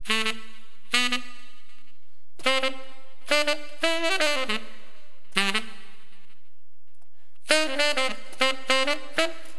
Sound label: TENOR, Wind; LiveAct Pool; AcidJazz Funk